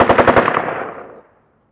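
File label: gun ak47 distant; ModernWarfare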